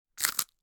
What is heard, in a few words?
Chewing Breadstick Single